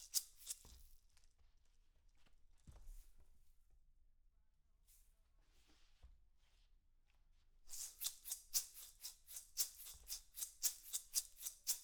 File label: PERC OH